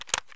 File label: ak74 mag out plastic; Escape from Tarkov